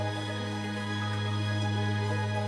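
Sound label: free spin out loop; turn your fortune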